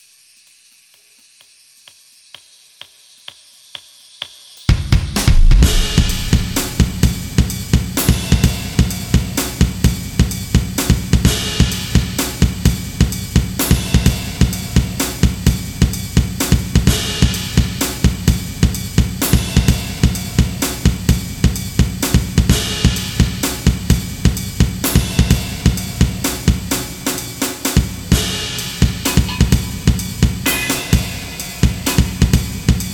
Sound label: Snooze Beat